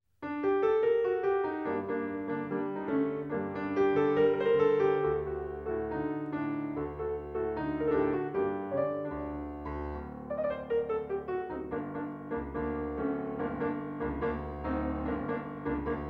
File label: Tango